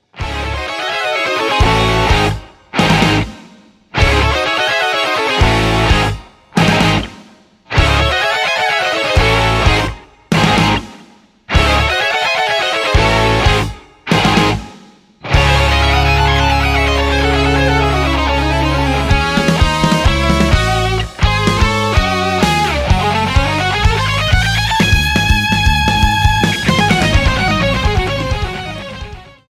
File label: Binary Annihilation Glitch